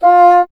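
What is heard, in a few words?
wind bassoon F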